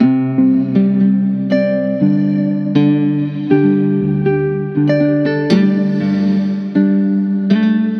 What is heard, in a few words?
Musical Loop 120 C Major; Upsurge; Ghosthack